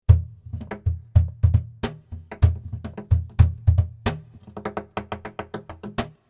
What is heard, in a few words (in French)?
son cajon